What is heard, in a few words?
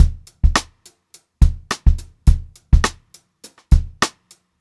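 104 straight beat, Funk Groove Drumloops; USB Soundscan vol.38; AKAI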